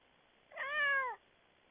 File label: cat meow